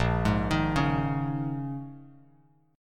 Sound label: Bb Minor 11th down Bass Standard, Bbm11 chord; arpeggiated; JGuitar